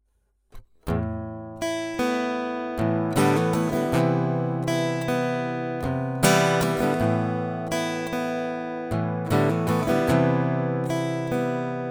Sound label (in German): Akustik Git direkt